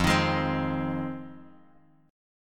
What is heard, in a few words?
F Minor 7th F Guitar Drop D